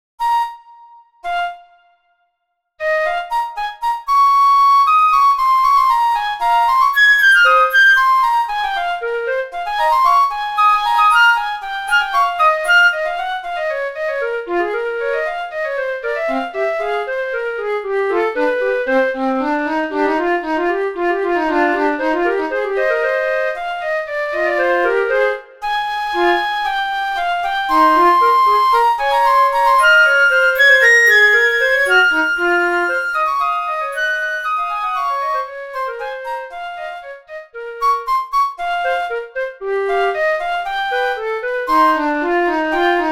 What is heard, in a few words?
Fugue; 2 Flutes; Concert 2024; Antique